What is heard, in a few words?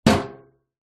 household bbq metal lid close; zapsplat